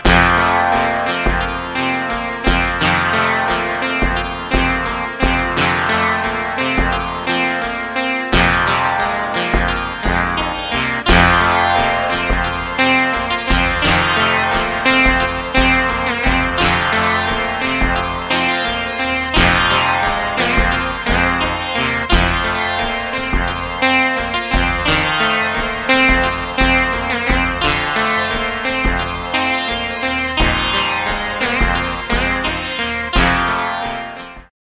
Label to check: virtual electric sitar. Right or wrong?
Right